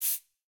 page turn; VoiceView

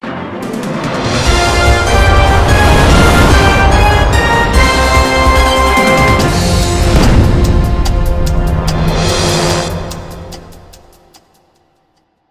Opening Titles; [from games] (USA Clock); Millionaire Soundtrack; US Clock (2008)

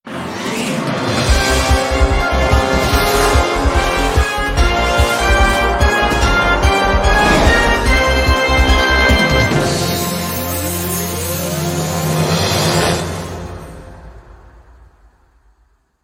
Opening Theme; Revival UK 2019; Millionaire Soundtrack; UK Revival (2018)

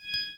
ding; Windows; Glass

